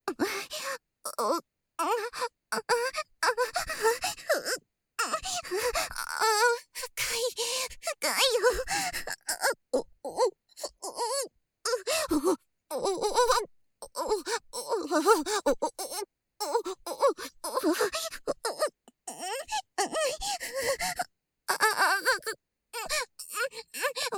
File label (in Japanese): オホ声（SEなし）娇喘